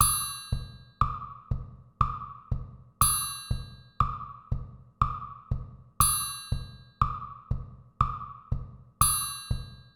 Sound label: deep triple duple metre dots; organisation of time metre